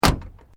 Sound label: Metal Light Movement Minor